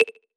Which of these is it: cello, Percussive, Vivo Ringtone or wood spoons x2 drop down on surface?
Percussive